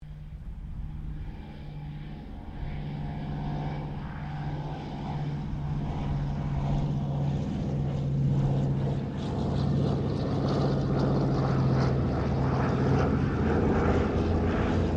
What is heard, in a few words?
AIRCRAFT DC3 SQUADRON 2 PASS; Airplane; Vehicles; Aircraft Collection